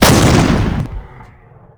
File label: 20mm fire; WAR